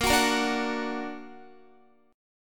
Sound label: A sharp Augmented, down, Guitar; strummed; tuning 4E,4A,5D,5G,5C,6F; chordshape